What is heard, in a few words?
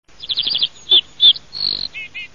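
ovsyanka kroshka golos, little bunting voice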